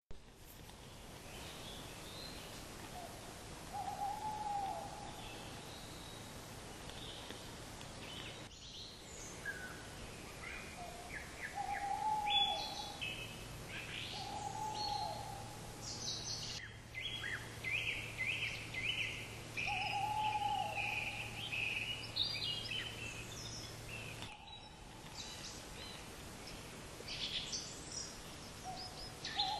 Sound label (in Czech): sycek obecny